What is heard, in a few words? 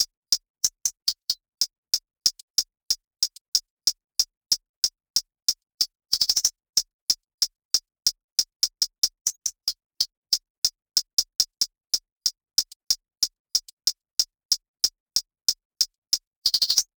Hats loop 93